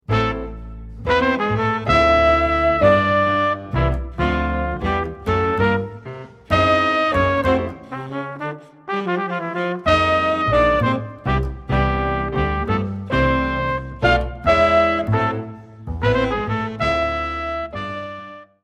minus drums

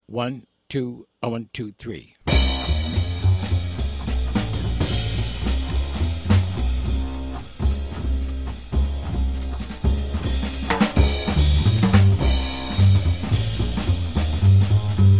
bass drums only, jazz; to feature piano, guitar, vibes, or any melodic instrument that likes to play without harmonic accompaniment

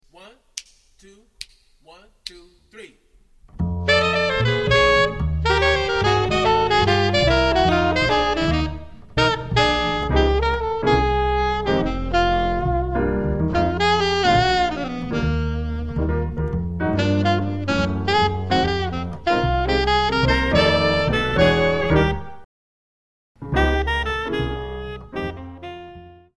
minus drums

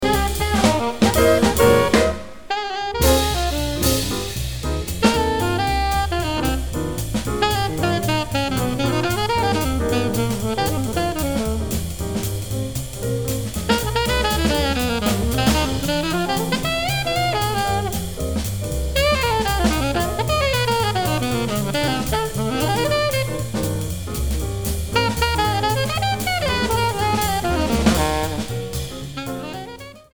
Transcribed Alto Sax Solo

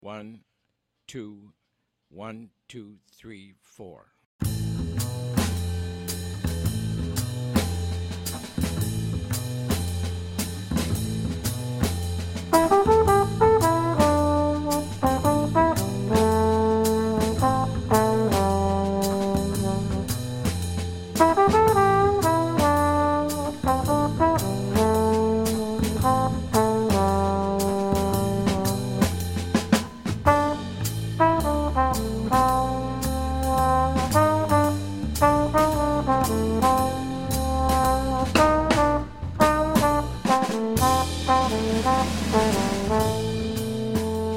minus Piano; count off sets up the intro; play the intro; play figures and comp for the melody 2 times

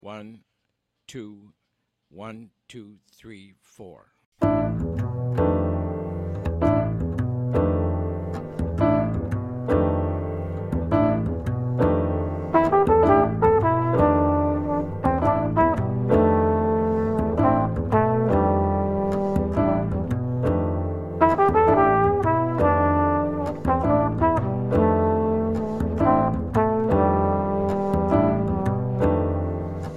minus Drums; count off sets up the intro; comp for the intro; comp for the melody 2 times

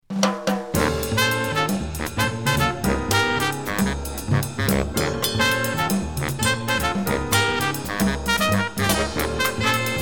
minus melody

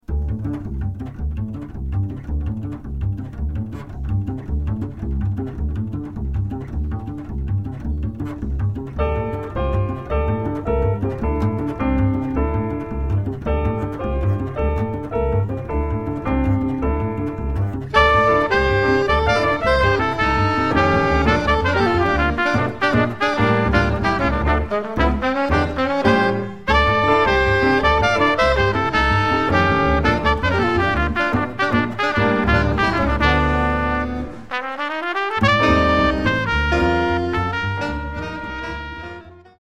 6-8 quintet minus Drums

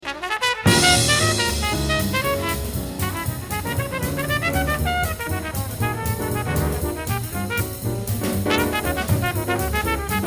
solo

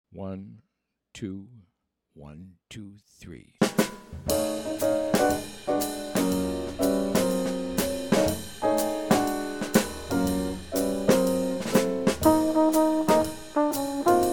fast minus bass

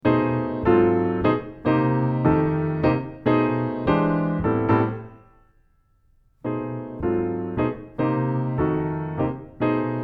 solo piano arr, A romantic and plaintive bossa

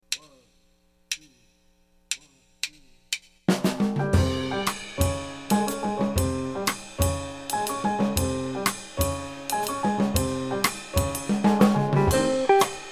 minus Bass

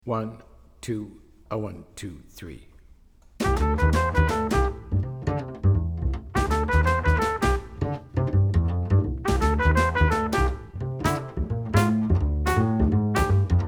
minus piano

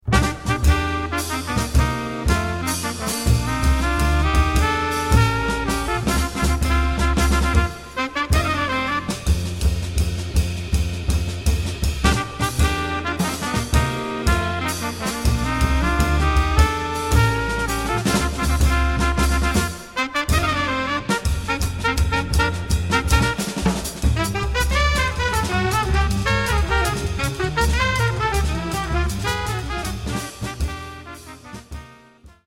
no piano

